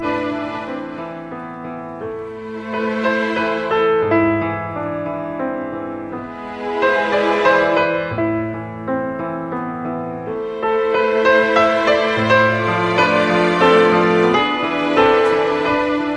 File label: piano, Sad